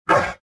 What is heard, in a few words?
gasp